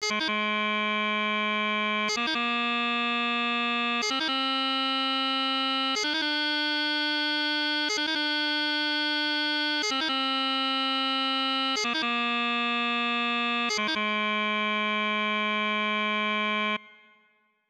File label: Doublings Excercise Lowhand Doublings, piping